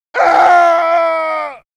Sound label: male extreme scream